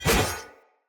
Short Sword Whoosh; Weapons; Swords; Dendrit Short Sword

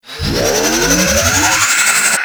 Death Charge; Warframe; Teralyst; Vomvalyst

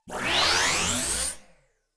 Shield Up; Tenno Shield; Shield Recharge

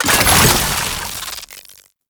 Alchemy Grenade Deposit Electricity; Entrati

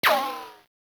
Weapon Hit Effect Metal; Warframe; Caliber Chicks Minigame; Weapons; Hit Effects

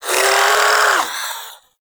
Shadow Vocal Pain; Warframe; Duviri

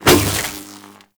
Prod Whoosh; Warframe; The New War; Drifter

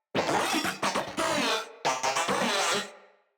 Moa Vocal Idle

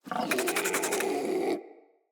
Infested Charger Idle

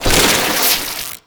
Debris Fabric; Warframe; Entrati; Ambience

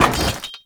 Generic Ledge Grab; Warframe; Characters

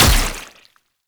Wrist Gun Alt Mech; Weapons; Warframe; Tenno

